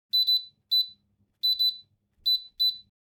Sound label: Microwave button beeps; appliances